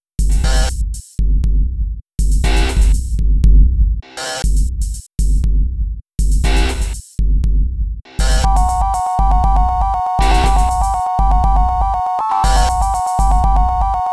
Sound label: sinewave